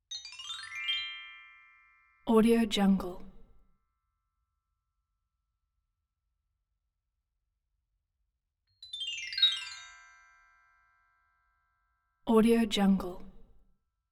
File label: magic appearance; collanderson